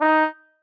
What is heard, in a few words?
marimba trombone; ゆめ2っき